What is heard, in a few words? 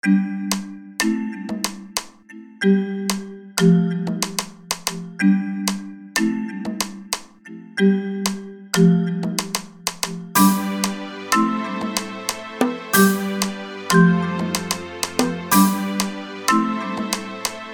Bass Caller